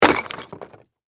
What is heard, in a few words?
Impact Rock Pile